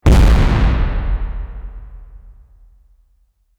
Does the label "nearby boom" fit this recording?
Yes